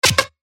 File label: PERC C Kaku, percussion; revealed percussion vol 3